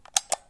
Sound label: popup